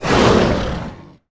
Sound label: death; Paraca